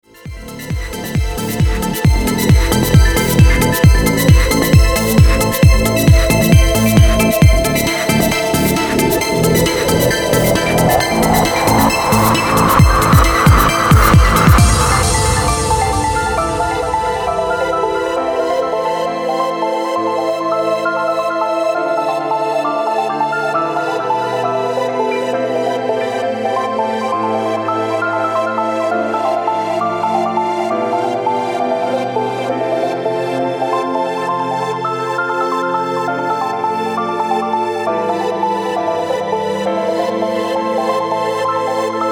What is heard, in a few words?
trance